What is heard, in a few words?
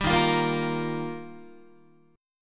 D sharp Major Add 9th, Guitar; strummed; D#add9; 10,11,8,8,9,9; JGuitar